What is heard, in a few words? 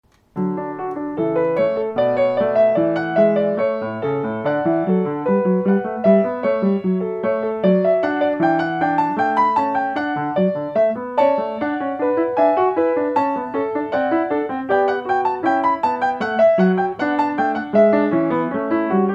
Bach Little Prelude D Major